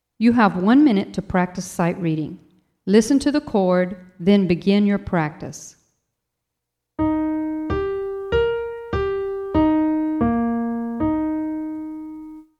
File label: Alto Eb Major